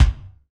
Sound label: steel kick drum sound g key, kick drum sound tuned to the G note